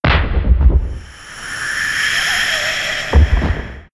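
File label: caterpillar death, monster; Senillinea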